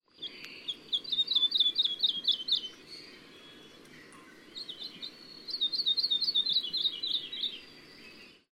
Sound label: hawaii akepa